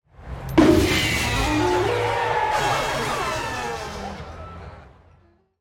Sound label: Stinger No Music